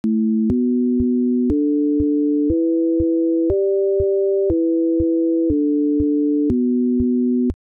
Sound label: battle loop; bgm; heros